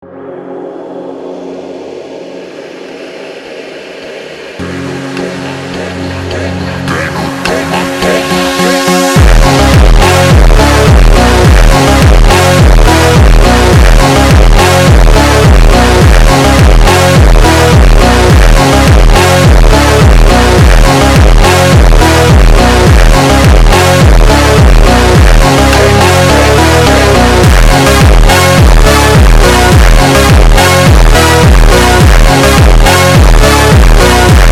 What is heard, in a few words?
AUTOMOTIVO SLOWED REVERB